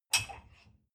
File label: dishwasher cup grab soft